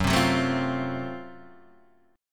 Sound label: F sharp Minor Major 7th Flat 5th, Guitar, Half Step Down